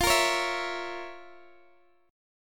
F Major 7th Suspended 4th Sharp 5th, Guitar, Drop D